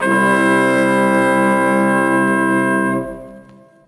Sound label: Horns